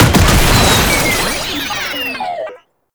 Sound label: Tank Drone Explosion; Enemies; Abilities; Warframe; Lasrian Tank